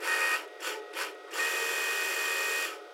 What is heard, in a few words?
tech oneshot, office